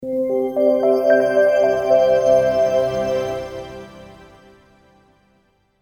Logo, Audio Motif To Accompany Visual Logo Or Screen; Miscellaneous Musical; Miscellaneous Musical Sound Effects; Miscellaneous Musical (Sound Effects:Musical); Stuart Duffield